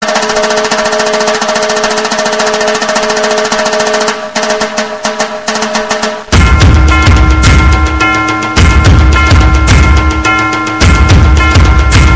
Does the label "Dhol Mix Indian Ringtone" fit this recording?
Yes